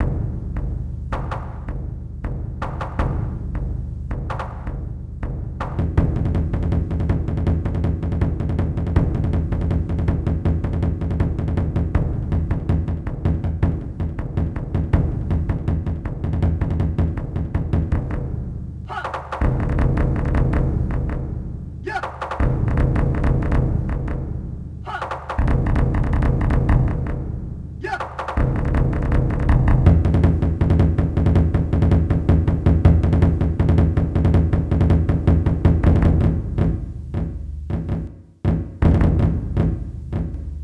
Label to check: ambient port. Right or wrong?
Wrong